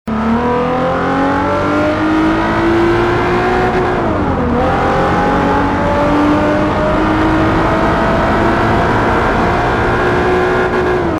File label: second, vehicles; TDMCarpack; TDMCars